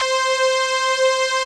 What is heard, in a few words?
C Synth, One Shots